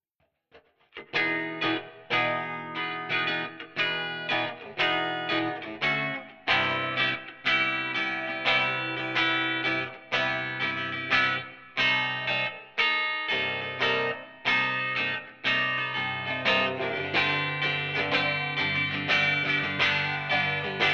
E Guit Clean unprocessed bypass

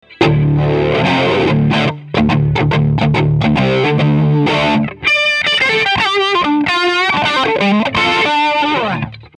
amplitube chorus

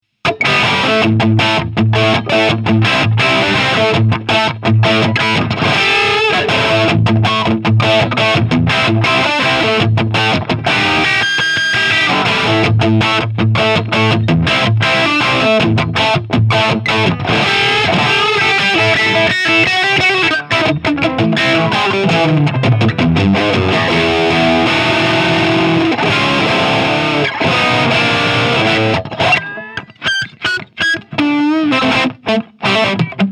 Tilt 0 Boost

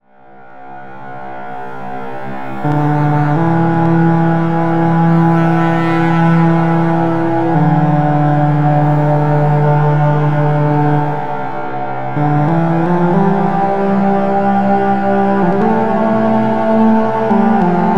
Modular Patch; Roli

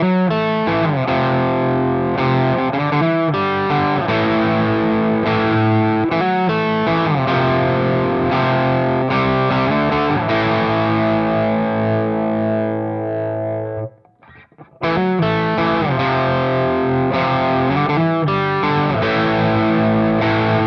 Space Charged V2 Tight Fat; Crazy Tube Circuits

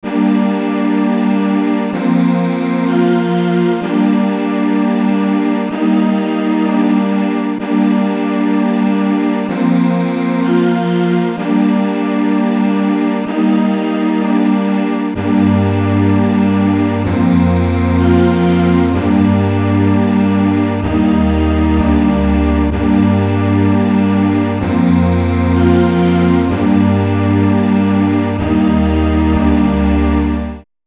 CMI V Pad; Arturia

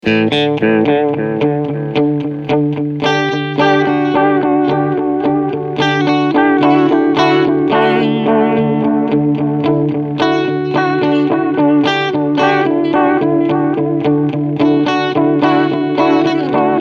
Delay Modulation

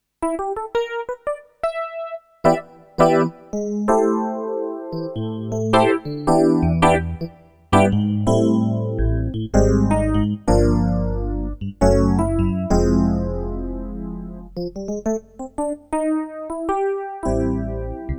HP504 EPiano

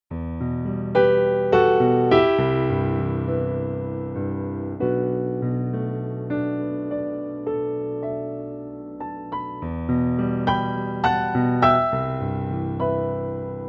Roland RD2000 Stage Grand V Piano

